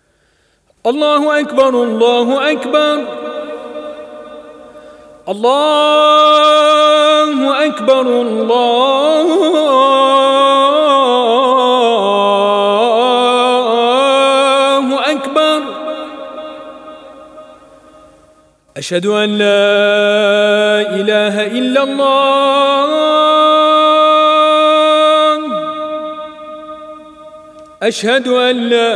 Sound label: azan